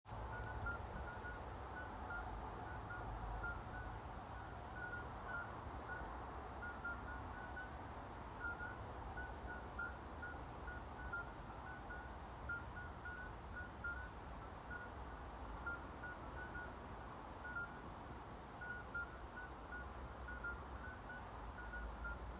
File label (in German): Geburtshelferkroete; Austmeyer